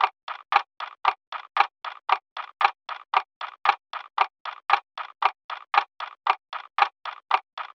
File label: household clock tick; sound effects one